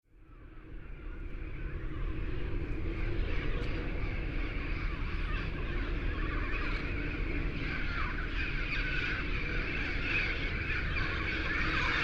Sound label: red-crowned and yellow-headed parrots pasadena